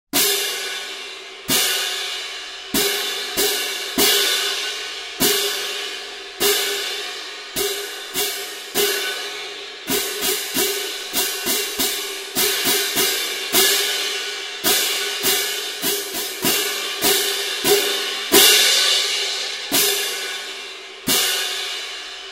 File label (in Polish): Talerze